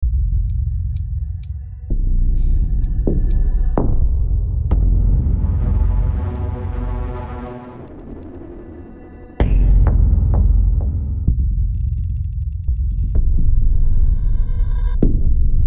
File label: Cinematic